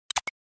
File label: camera click